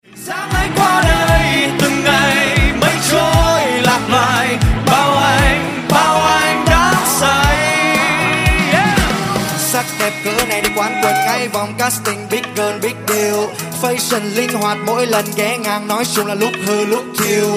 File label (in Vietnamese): Rap Viet TikTok